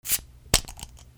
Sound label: KITCHEN